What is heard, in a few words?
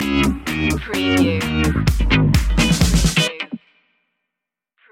disco loopy stinger